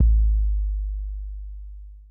Kick B Hit; Hip Hop; 102 Fmin

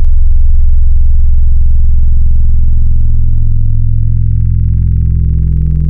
Small Sin FM; Cymatics; Wavetables Collection Vol.2; Serum

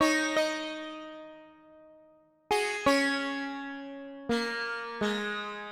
Sitar, 084 Ebmin

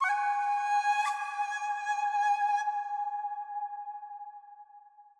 Flute, 092 Cmin

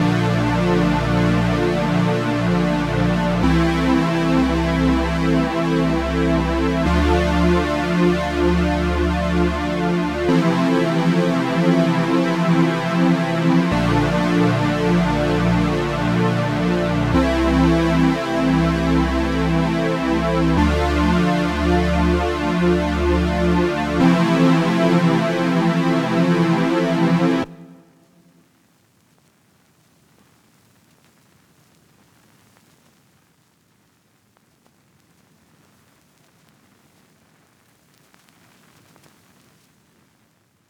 140 BPM D Min Chords; Cymatics; Destiny Gold Expansion Stems; Last Flight

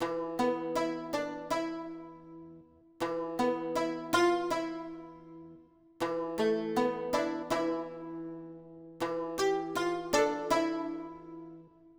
Oud, Hip Hop Exotica, Apple Loops; Big Fish Audio

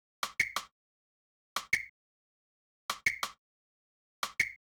Percussion, 090 Cmin; Hip Hop; Hip Hop Exotica; Apple Loops; Big Fish Audio

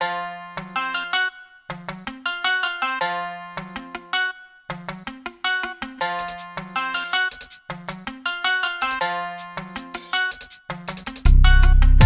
080 Fmin, Hip Hop Exotica; Apple Loops; Big Fish Audio

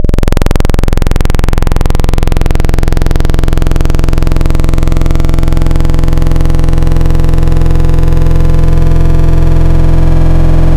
Saw Sqr, wavetables; Cymatics; Wavetables Collection Vol 3; Serum

